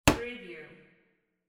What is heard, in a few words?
glass cup set down